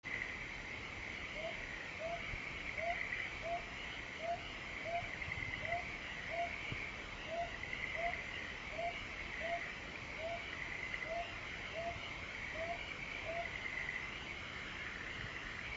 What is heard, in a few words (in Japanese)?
aobazuku, bird; フクロウ目; フクロウ科; アオバズク （青葉梟）; 白鳥神社後ろの姥杉 鳴き声; 蘭梅山; 野鳥